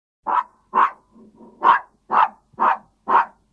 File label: yorkshire terrier sound